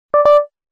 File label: FAILURE; LG; A380